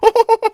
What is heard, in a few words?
Monkeyboss Laugh